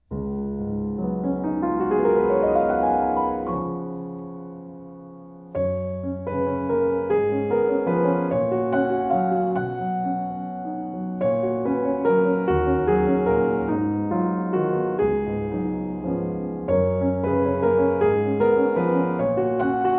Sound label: Solo Piano